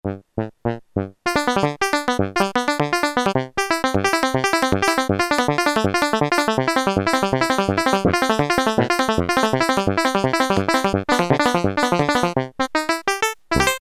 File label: Banjo, Korg PolySix; Factory Presets Bank A